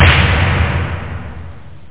military; DRM PERC

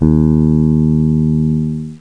bass, d#; Virtual Graceland

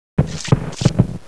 Dead Punt

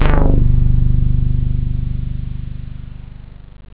analog, synth bass

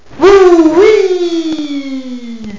whee

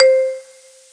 ting; MOBICLIC 13

